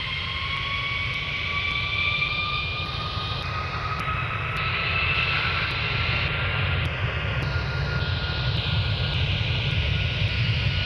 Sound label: airplane